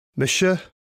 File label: Key Sounds